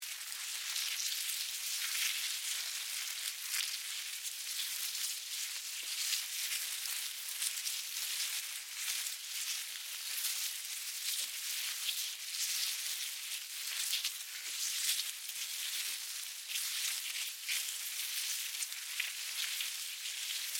Ants Colony, Animal, Ants, Colony, Bugs, Movement, Insects; SoundEffects-BlastWave